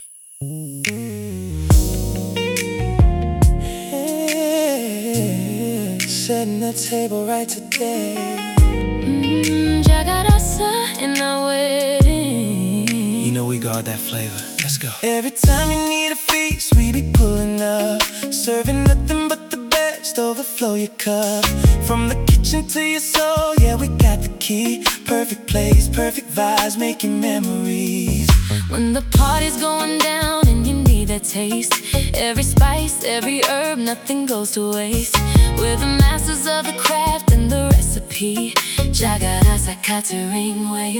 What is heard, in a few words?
RnB